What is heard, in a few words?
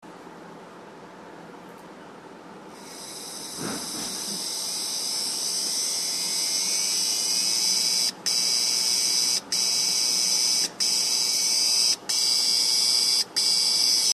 Seagate heads, damaged hdd sounds